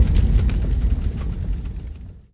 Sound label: fire out; Original TK Sounds